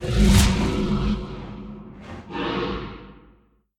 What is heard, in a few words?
dragon Fly By; steamtower